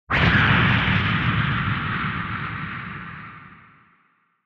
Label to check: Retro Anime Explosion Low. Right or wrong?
Right